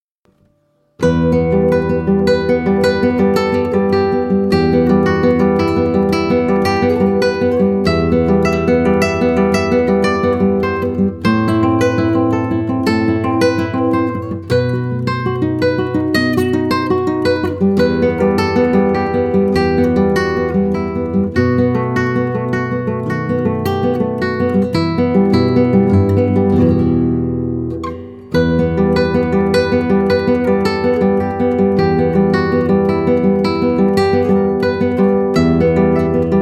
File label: romanca solo gtr